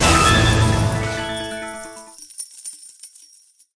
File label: medium Win; eggomatic